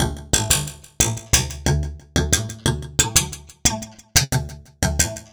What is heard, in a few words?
Remixed Acoustic Bass; Sonic Foundry; Leo Cavallo Bass Taster